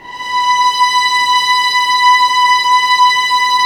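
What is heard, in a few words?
violins; String Master Series; Vlns 6 mf-f; Vls6 mf amb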